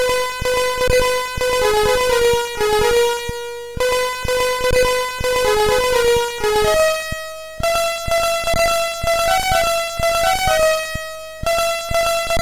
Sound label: Melody, sid MOS6581R4; HVSC; Beauty